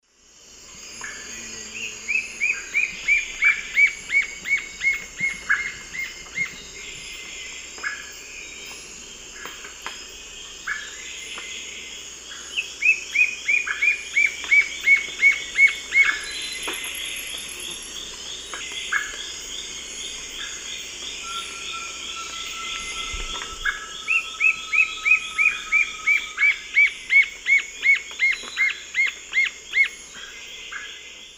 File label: Myrmotherula axillaris, song; S. America; Eastern Lowlands